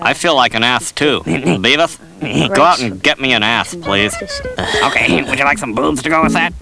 bass

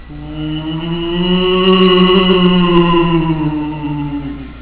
scaird ghost

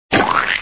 splat!

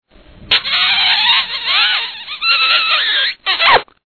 kissy

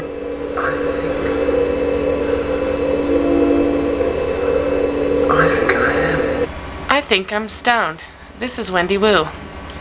WOO

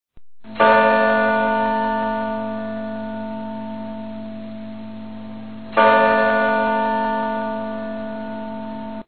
BELLS

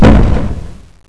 boom

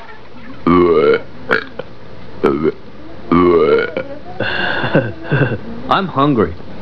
burp

